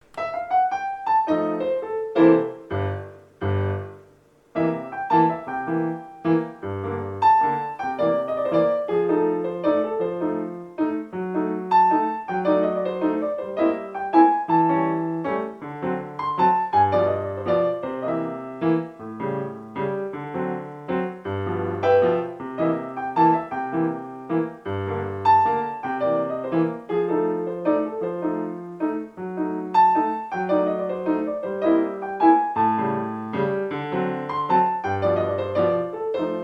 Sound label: Blues Bar Piano